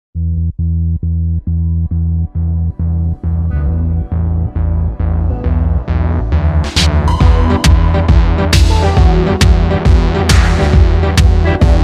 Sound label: Jazz